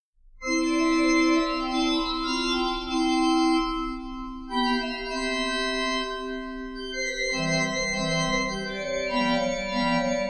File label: pastorale C dur